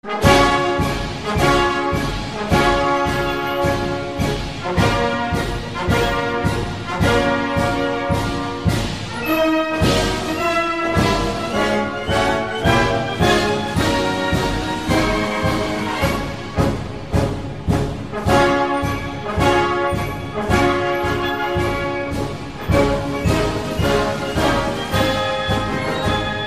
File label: march ringtone